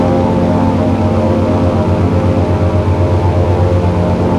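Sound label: an2 cockpit left, engines; War Thunder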